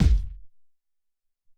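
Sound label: Hop Kick 4, Alesis SR-18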